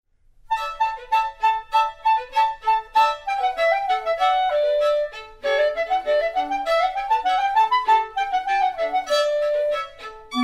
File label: suite for violin